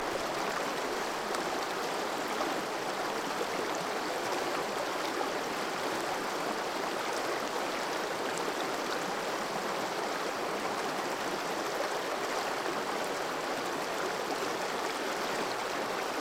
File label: River